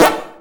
Eat; Pac-Man Championship Edition 2 Plus

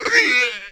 Monster Small Green Human type Death; Inotia 3

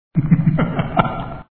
Voices Enemy Laugh; Pencak Silat

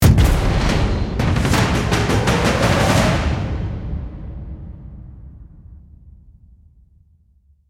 UI BGM Start; CastraWar

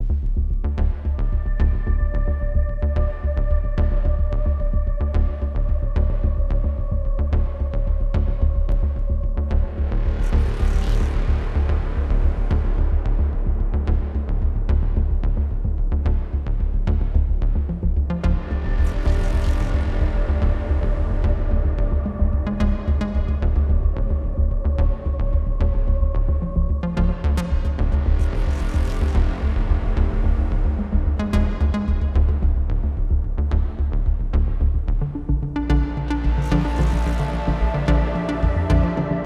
Moon Patrol FX Stem Loop

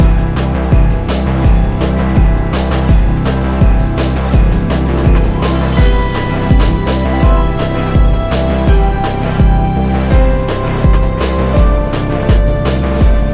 music combat; VALENTINE; V-DAY

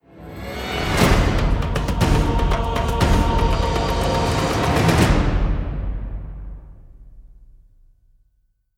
Victory screen; PS3; god of war ascension demo

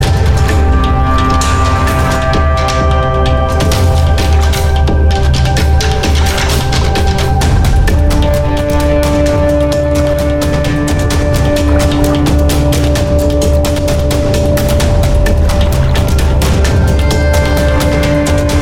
water loop drums shorter; Adventure Xpress

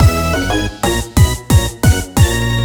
Stage back Intro; invercity

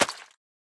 Squished; Boomerang Fu

